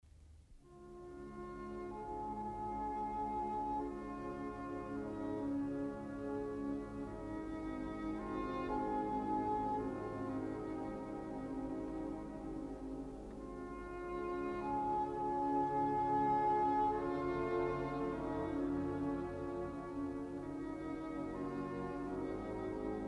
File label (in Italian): corno inglese solo; oboe; strumenti